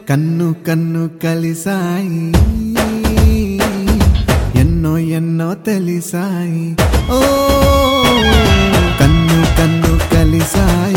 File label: Telugu Ringtone